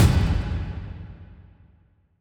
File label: Impact Thud Metal Falling Fast; Impacts Cinematic